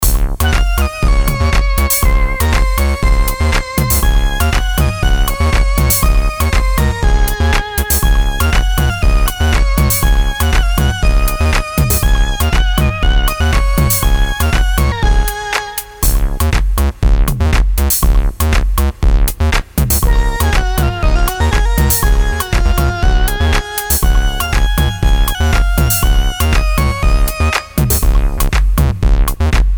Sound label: Electronic World; xils3; Xilistics